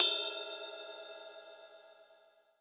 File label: Paiste, ALL DRUMS; Best Service; Alpine Volksmusik